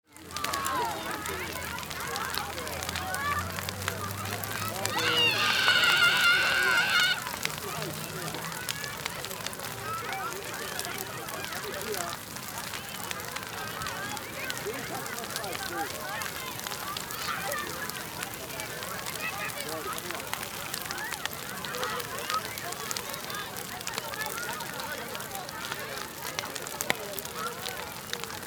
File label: easter fire atmo germany